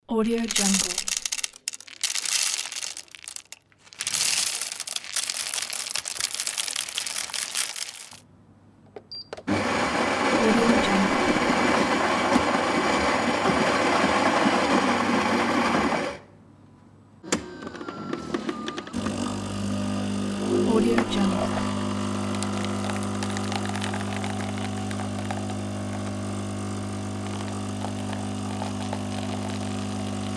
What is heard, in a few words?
coffee machine